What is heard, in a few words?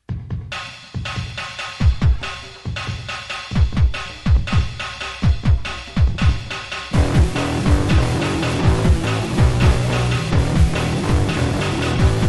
INSTRUMENTAL; rock and loud 2007